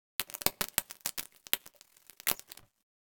Electric Static Sparks; Hollywood Edge; sound designer's toolkit v2

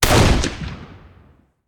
Rifle; Sound Ideas; Guns

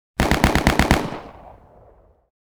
gun machine gun; NCH Library

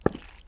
boots wet hard; Apotheorasis

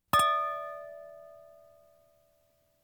Household Phones

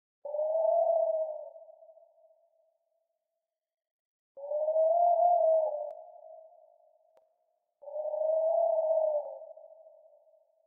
wind

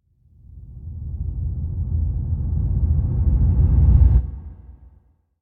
Drone Low Deep Oncoming; Hollywood Edge; sound designer's toolkit v2

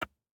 DICE on Hard Wood, Grab, Standard, 1 One Die; Samples; ArticulatedSounds; DICE Sfx Party (Extended)

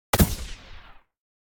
Gun Shot Muted Medium; Hollywood Edge; sound designer's toolkit v2

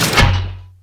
Mortar Shot; Weapons

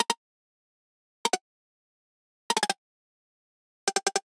Digital Click Negative

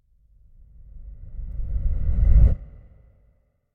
Whoosh Reversed Sub Air; Hollywood Edge; sound designer's toolkit v2

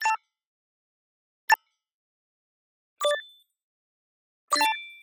Digital Click Neutral; boom library; The Interface

